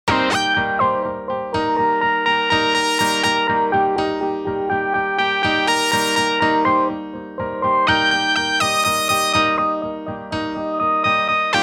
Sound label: Porta Queen Lead Dry 2 Wet